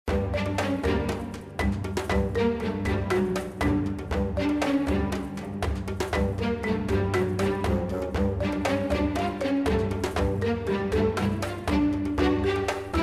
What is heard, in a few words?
bgm; 音效